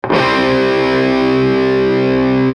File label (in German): C5 Beispiel